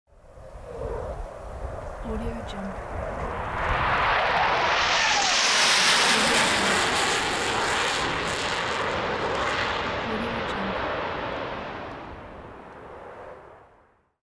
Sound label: jet fighter